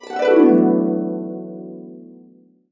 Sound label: D, Harps; USB Soundscan vol.62; Complete Harps; AKAI